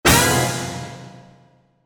jingle

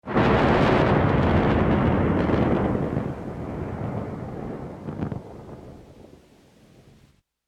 japan thunder

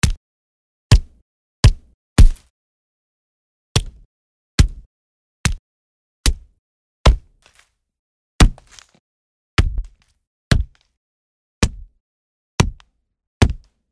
punch

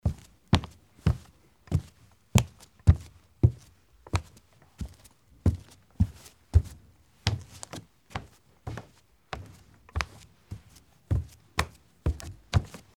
wood corridor

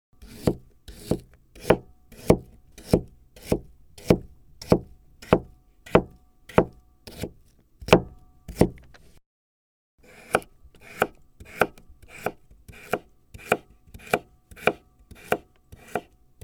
carrots cut

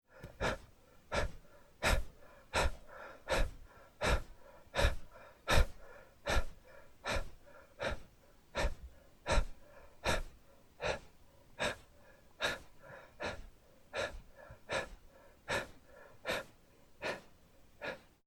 breath run slow

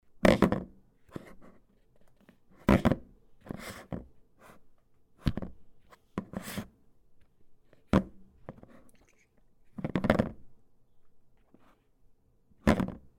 plastic bottle

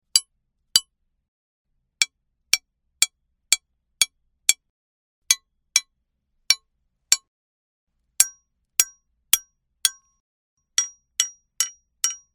hammer

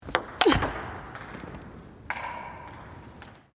ping pong smash

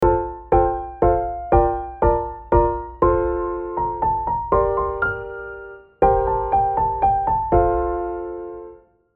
jingle piano

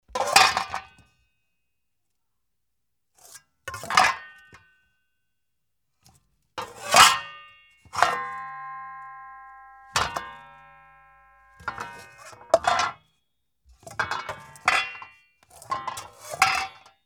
frypan

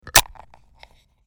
can pull up

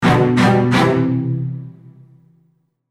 logo orch

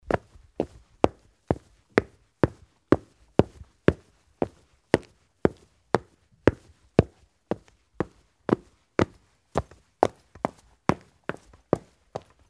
footstep shoes hard

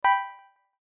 kiran

